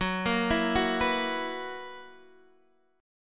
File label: F# 11th